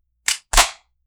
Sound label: gun sudden movemnt gun po